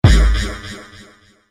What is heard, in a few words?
Laser Percussion Shot, Laser Gun